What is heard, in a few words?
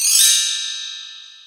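RX PERCUSSION; Kid Nepro; Elite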